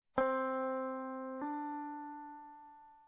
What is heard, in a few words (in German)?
hammer on